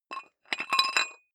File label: dumbbell thread put on weight slow, fitnessstudio